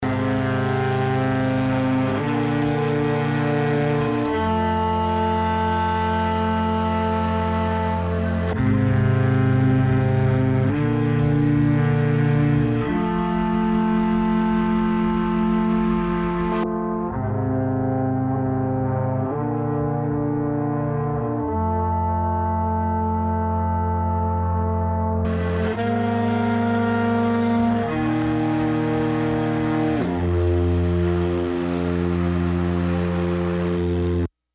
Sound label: String; Focus